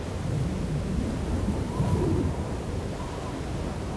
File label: dry wind; environment; Tribes2